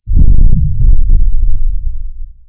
cannons sub; guns; tanks; War Thunder

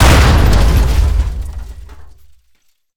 Wings Damage; DCS

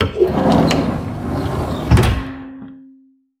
Aircrafts Mi-8MTV2 Door Close; DCS

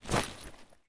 gear fast stereo; Escape from Tarkov

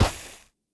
sand; Rust

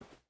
footstep hide carpet walk; Rust

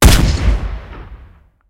cannon 25mm 72k shot; War Thunder

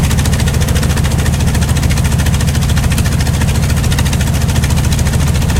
wildcat side, engines; War Thunder

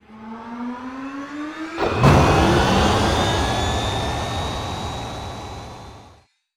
Aircrafts Engines APU AI9V Start; DCS

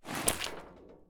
prone metal; Escape from Tarkov

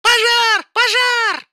Fire Alarm; Alarms; World Of Warships; Mosfilm

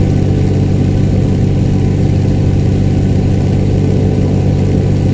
stuka cockpit, engines; War Thunder

